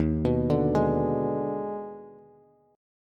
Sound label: Eb Major 7th Suspended 4th Sharp 5th Eb, Bass; arpeggiated; 11,14,12,13; EbM7sus4#5